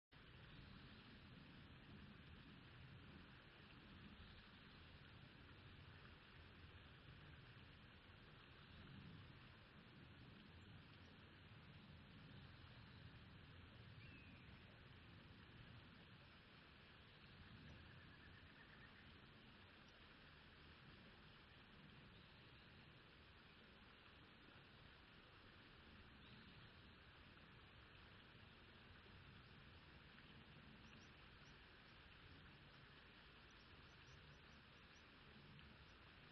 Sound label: riverside